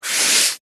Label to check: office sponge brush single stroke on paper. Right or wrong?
Right